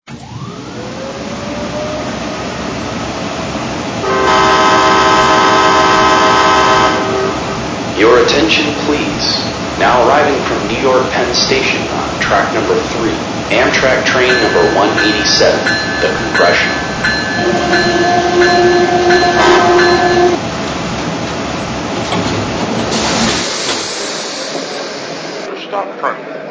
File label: AEM-7, trains